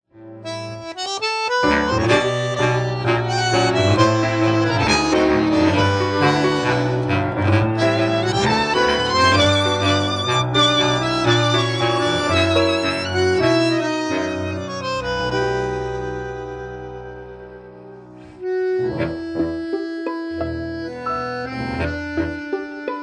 tzigane tango, TANGO

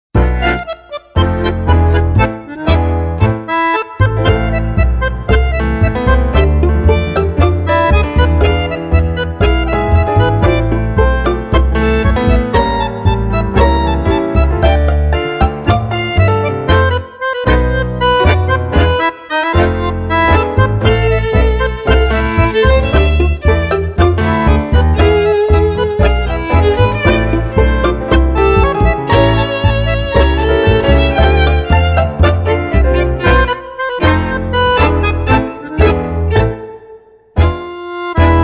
Tango

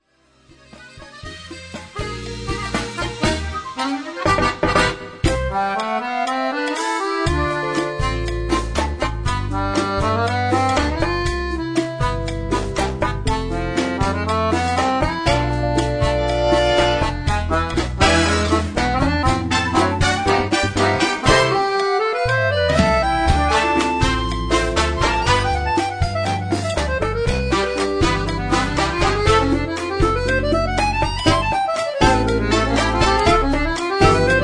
latino chacha

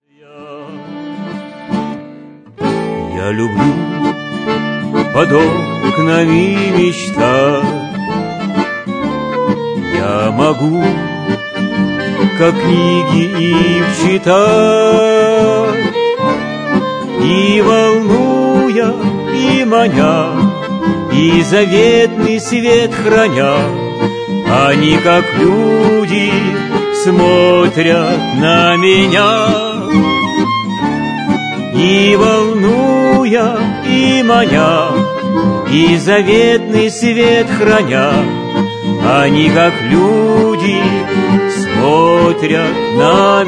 trio czardas

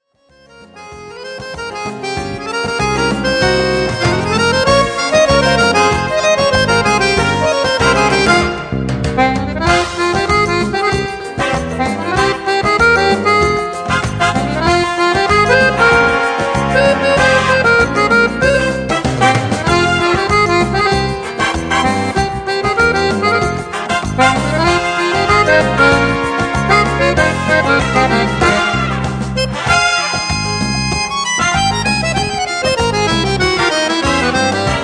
CUMBIA

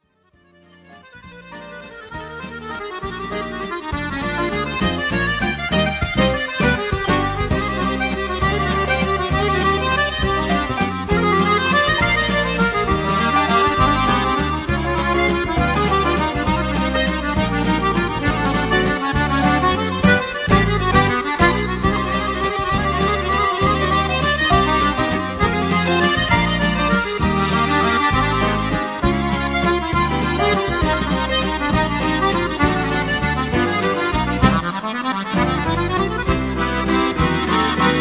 seduction musette

